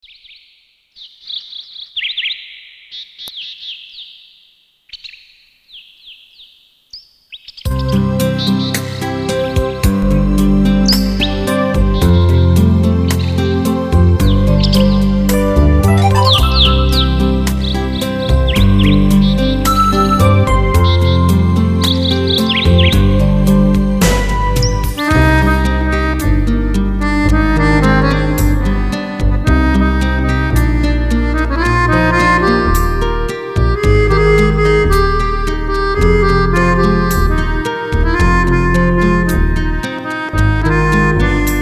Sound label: instrumental